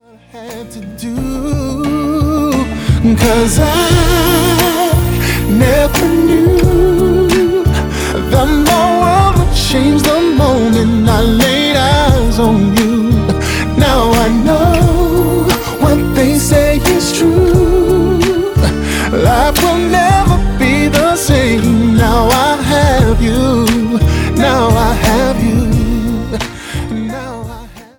ballad, vocalist

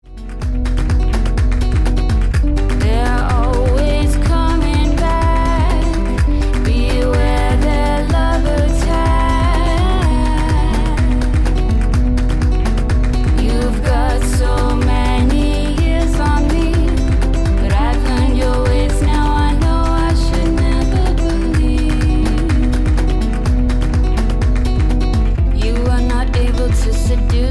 Indie Pop; Internet Session, New York

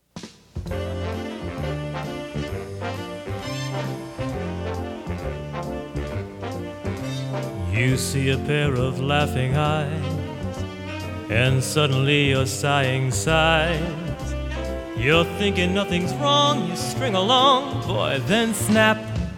jazz; Internet Session, Los Angeles

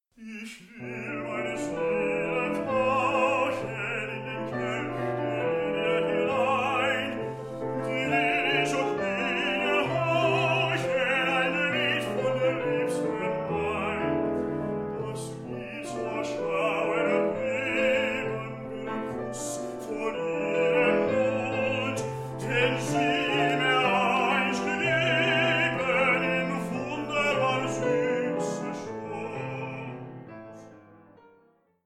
German Art Song; Internet Session, Los Angeles

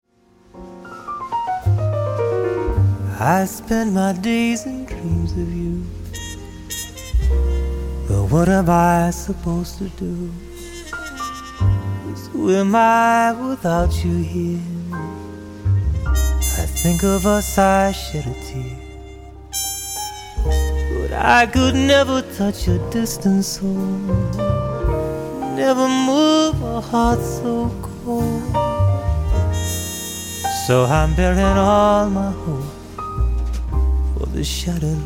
jazz; Internet Session, Los Angeles

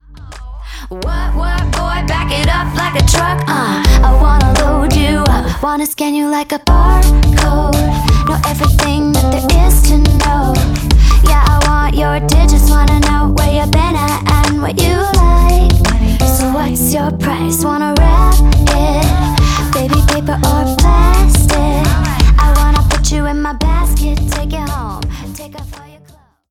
indie-pop; Internet Session, New York

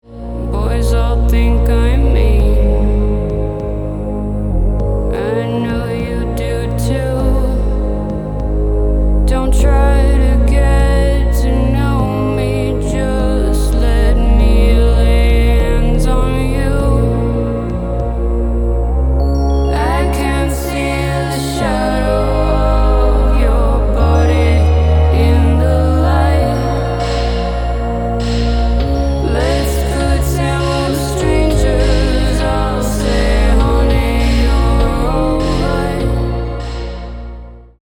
Downtempo Pop

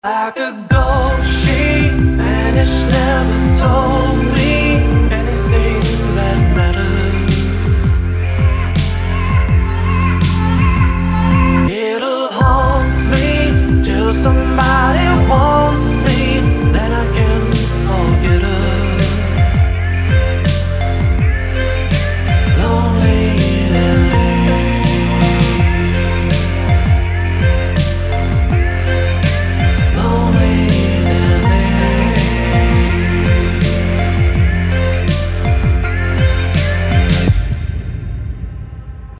pop

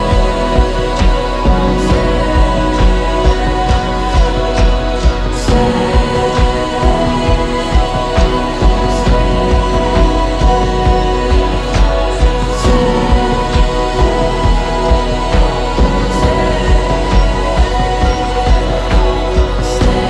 Choir; Internet Session, Los Angeles

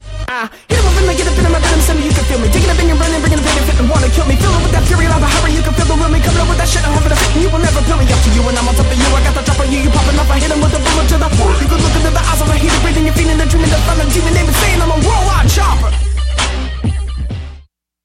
Rap fast chopper

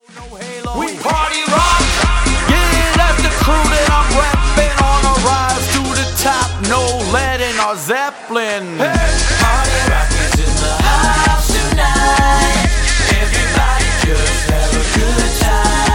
dance; Internet Session, Los Angeles

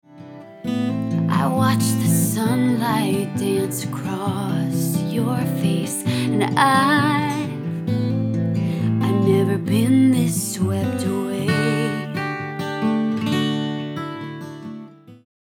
folk, vocalist

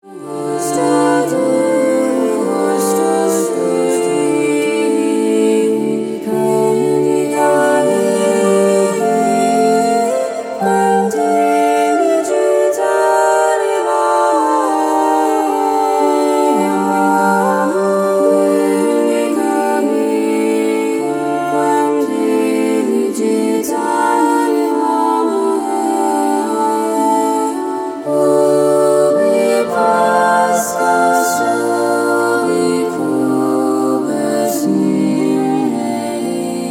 Renaissance Choral Music

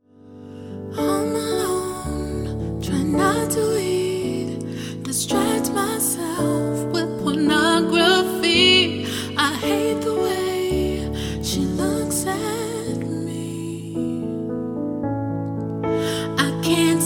Male Fantasy

